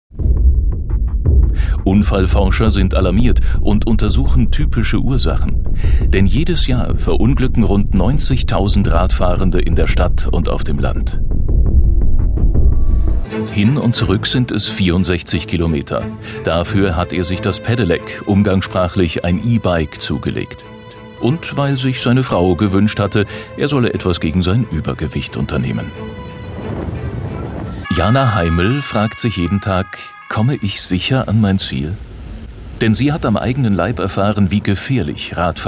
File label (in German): Doku dramatisch